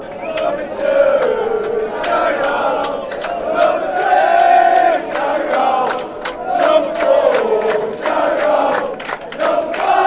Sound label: sunderland chant